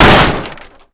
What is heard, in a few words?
ammobox med; Call of Duty Modern Warfare; physics